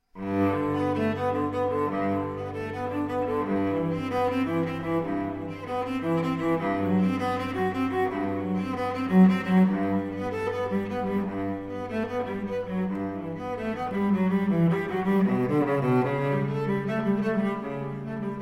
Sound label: Cello Suite in G Major, Prélude; Cello Suite No. 1; BWV 1007 I.